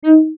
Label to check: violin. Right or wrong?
Right